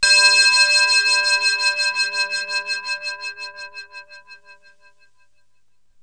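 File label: bell, Roland JX3P; Drums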